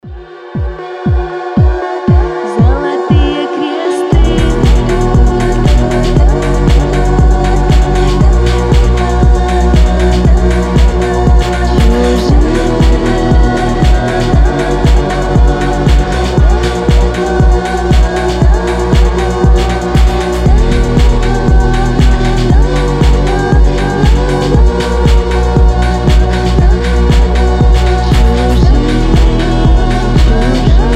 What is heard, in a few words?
groove; wave